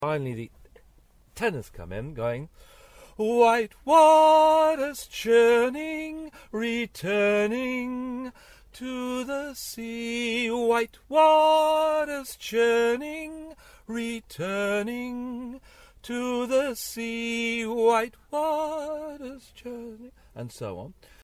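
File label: Tenor, choir; Individual parts; Parts for ending section [B]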